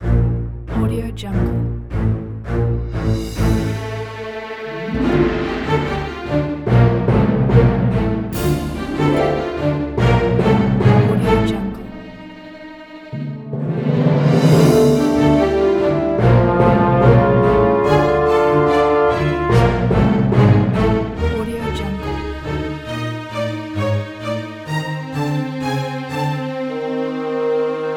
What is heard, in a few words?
cinematic orchestral suspense scene